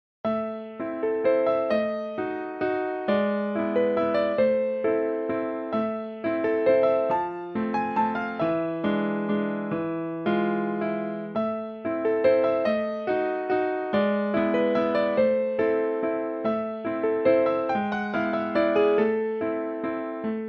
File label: waltz